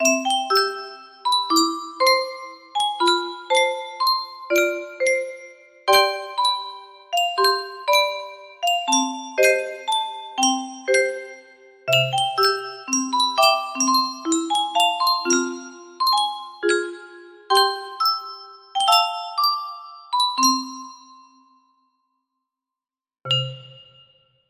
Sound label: 30 note; grand illusions